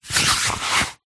hospital blood pressure machine arm band place on arm